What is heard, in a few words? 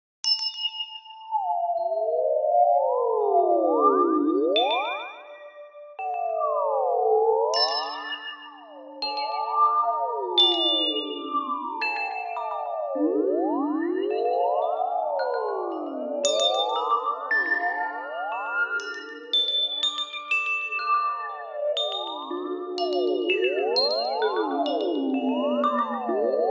Space Crystals, 70s Sci Fi